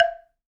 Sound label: Wooden Bar Strike